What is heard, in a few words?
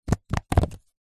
leisure board game game cards stack; telstrations